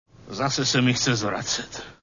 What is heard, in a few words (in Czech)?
zvracet